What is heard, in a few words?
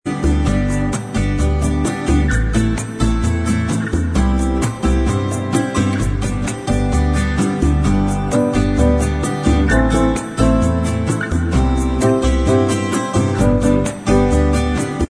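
bossa style, instrumental track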